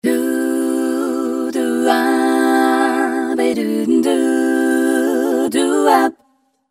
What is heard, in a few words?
major 135